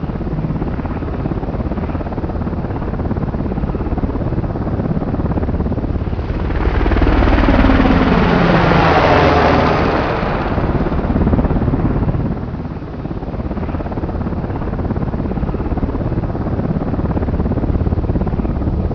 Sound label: buzzer indust vertushka; cstrike